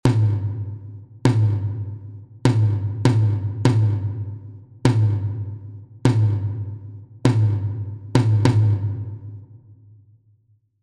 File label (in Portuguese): Toque - Surdo Mor